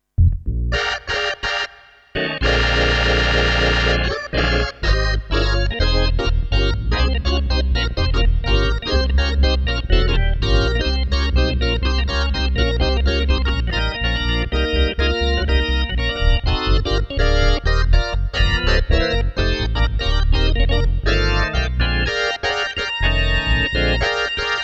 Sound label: Demo XK1 b3 cooking; hammond